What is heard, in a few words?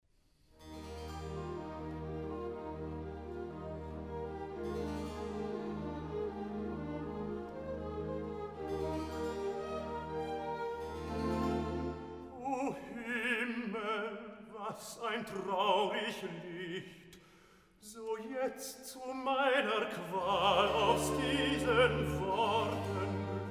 Recitativo; Mozart; Oratorios, Cantatas and Masonic Music; Grabmusik KV42-35a